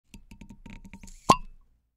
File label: Wine Bottle Opening Cork Pop (Popping Open Drink Noise